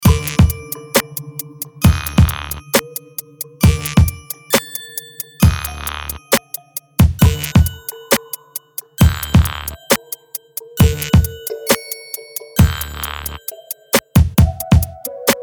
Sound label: loop; retro detonation